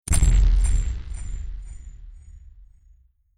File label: Impact Alien Stinger Hit Delay Evil Reverberant; Sound Design; Stinger Sound Effects